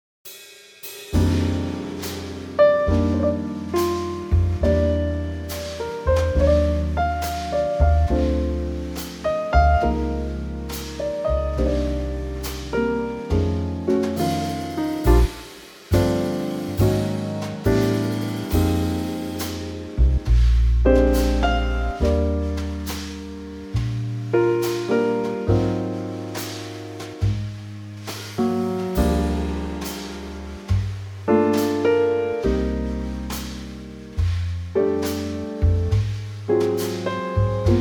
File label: Trio Bb